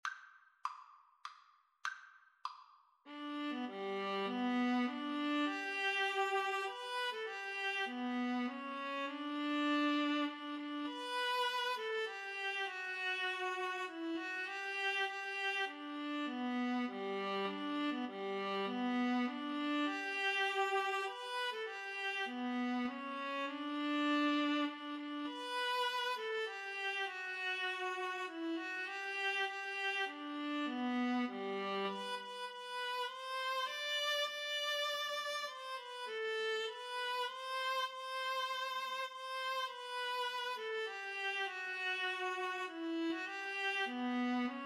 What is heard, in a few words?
star spangled banner Viola Cello; Viola-Cello Duet